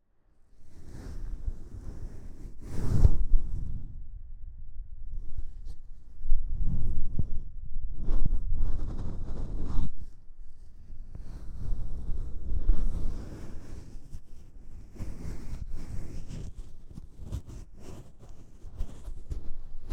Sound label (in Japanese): コットン手袋でお耳をマッサージする音(片耳ずつ)